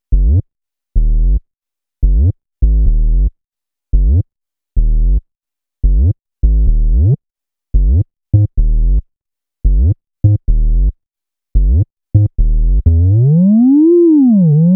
126BPM Pong Kit

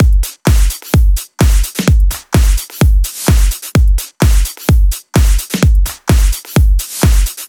Sunshine Drums 1, 128BPM; Vengeance Samples; Sunshine Kit

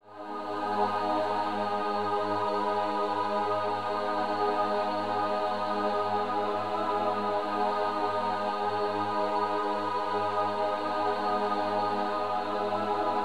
Sol-7 G#; Vengeance Samples

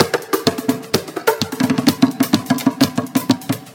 Latin House Loop 128 BPM; Vengeance Samples

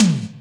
Tom; Percs

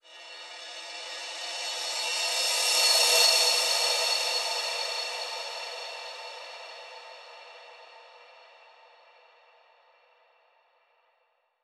ReCrash 138BPM, Sound FX; E minor; Vengeance Samples; Freefall Kit

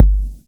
Bassdrums

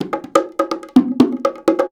percussion loop 125, bongoloops; house; PS-28 Vocal House Samples

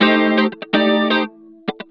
125 c, guitar licks; house; Vocal House; PS-28 Vocal House Samples; instrumentloops

